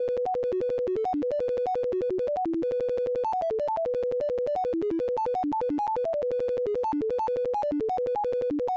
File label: beep boop